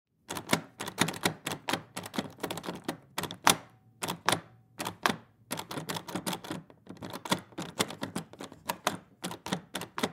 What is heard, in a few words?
Door Handle